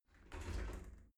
shower slide door slide close

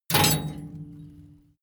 metal tools small drop; berufe; handwerker; soundpool

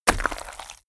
mud water splash impact; berufe; soldat

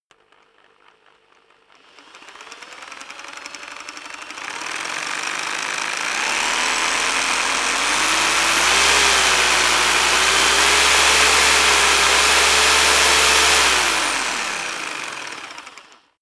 rock drill hammer speed up heavy; maschinen; bohrer; soundpool

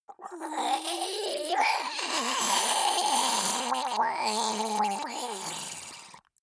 monster die gurgling high; kreaturen

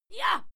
female fight scream short soft; schreie; menschen

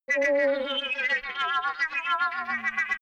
crazy science objects, energie

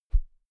whoosh thick low; swooshes-swishes

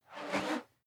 whoosh whistle slow

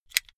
car flip key eject hard

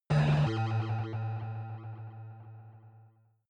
protective shield hit absorption buzz; energie

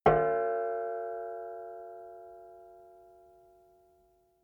plate hit ring soft; hits impacts

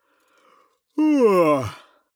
male yawn vocal fake; koerpergeraeusche; menschen; soundpool

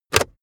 car backseat table pull in cupholder fast hard

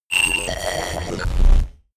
rumble glitch short, sciene fiction

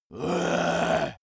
monster growl aggressive short; kreaturen

